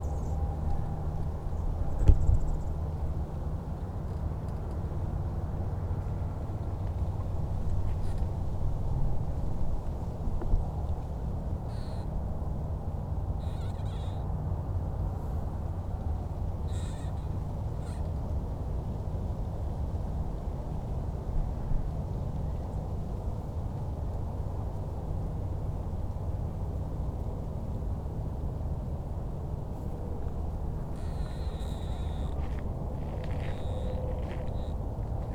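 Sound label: Griffith Park LA, field recordings; XY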